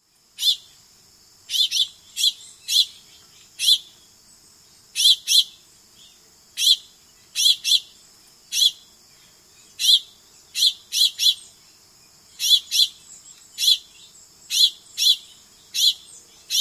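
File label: wheet calls, house finch; NY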